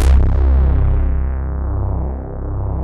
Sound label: Bass Analog & Synth, C2; BIGBIG; USB Soundscan vol.12; AKAI; VINTAGE